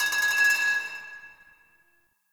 Pipe Cement Drop; Ambience; Warframe; Nineteen Ninety Nine

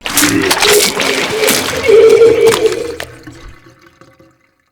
Vomit Attack, Infested, Crawler, Nauseous; Characters

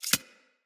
Arachnoid Micro Step; Enemies; Corpus

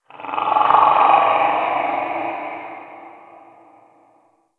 Amb Corrupted Animal Distant; Ambience; Mendicant Area

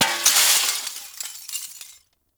Desktop Glass Destroy; Entrati Desktop Glass Destroy; Warframe

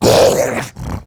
Pain; Battle Barks; Warframe; Grineer Ghoul Devourer

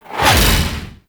Heavy Punch Whoosh; Warframe; Duviri; Dax Heavy; Abilities

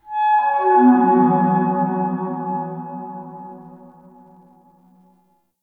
Cascading Fifths Flower Structure; Tau; Flower Farm

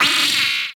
Amalgam Moa Vocal Pain

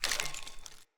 Foley Step; Enemy; Corrupted Sproutfolk

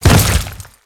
Step Goo, Footsteps; Warframe; Grineer

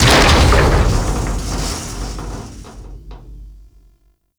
Ship Hull Impact Large; Hull Hit Effects; Warframe; RailJack

